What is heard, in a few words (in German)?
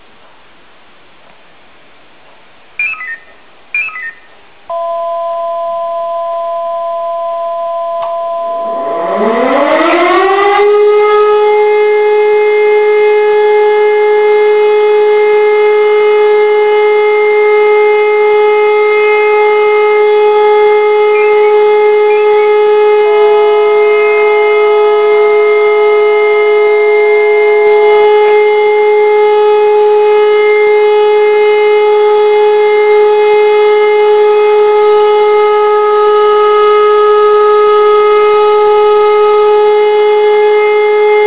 entwarnung, zivilschutzalarm